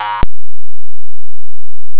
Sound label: bong